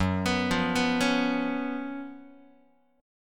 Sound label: Gb Major 7th Suspended 4th, down, Guitar; arpeggiated; x,2,2,3,3,0; 4A,4E,5A,5D,5G#,6C#; JGuitar